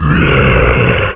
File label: death satyr hellcaller; dota; creep deaths